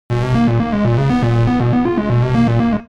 musical game arcade underworld

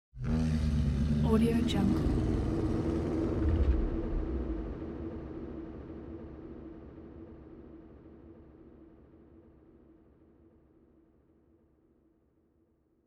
embittered monster

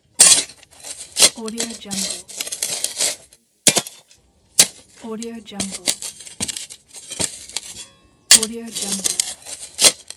shovel digging

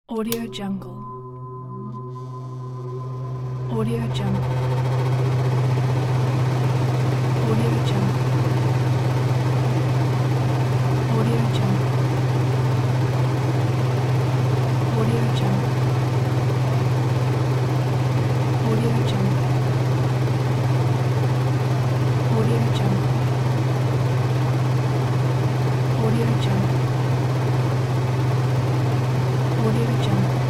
table fan sound

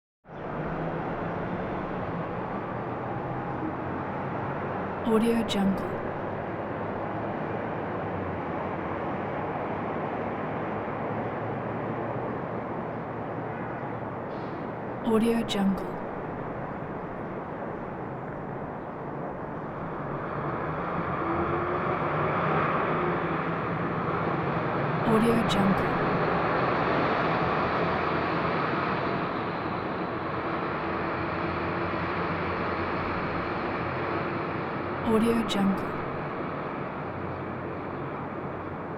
distant light traffic roar

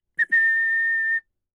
whistle ta da

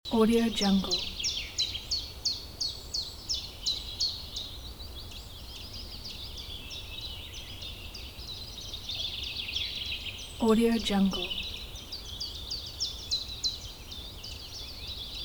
forest birds in summer ambience

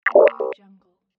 the no button

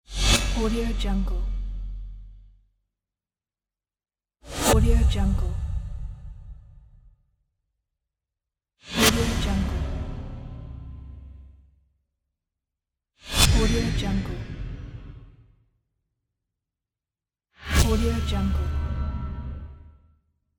sharp epic whooshes

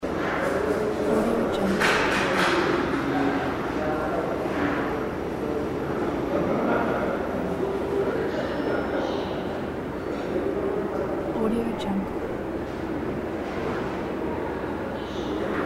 modern office building hall interior; vintervarg

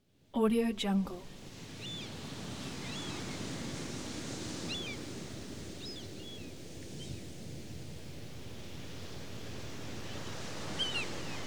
beach ambience; goodberry

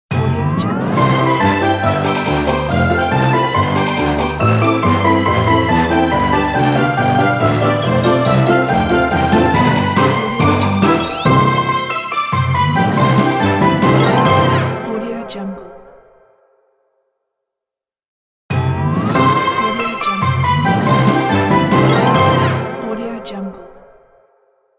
cartoon fun ident

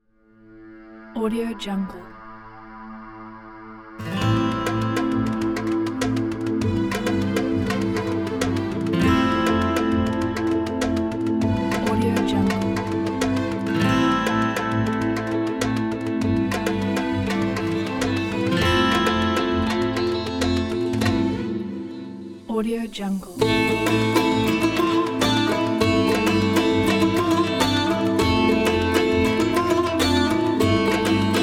middle east

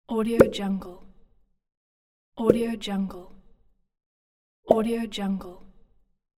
bottle cork pop sounds

